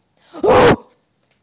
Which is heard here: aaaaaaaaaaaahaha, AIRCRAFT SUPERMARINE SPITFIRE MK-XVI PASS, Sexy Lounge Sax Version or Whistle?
aaaaaaaaaaaahaha